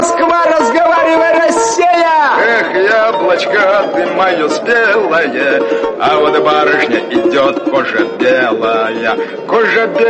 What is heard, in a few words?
russian chastushka